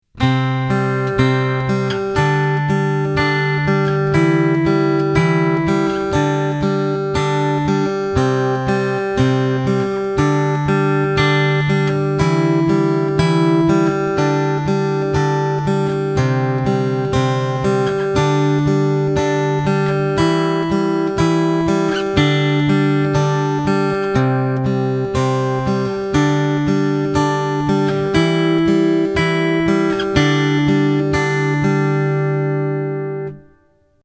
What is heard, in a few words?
Fingerstyle, acoustic guitar